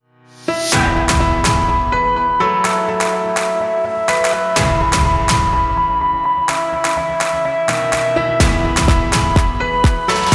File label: Instrumental Bikalam